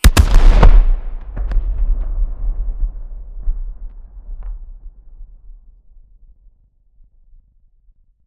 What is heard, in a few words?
widow final washout; Titanfall 2